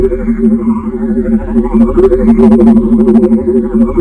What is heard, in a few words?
wave loop in wall